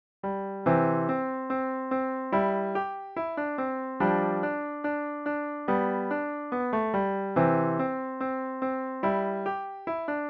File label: michael finnegan piano solo